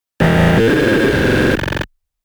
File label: noise, Pink Noise